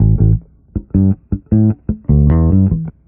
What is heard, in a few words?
bass, Loops Phonky